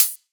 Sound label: HiHat, Psytrance; Drum Shots